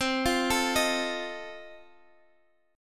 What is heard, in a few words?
F 7th C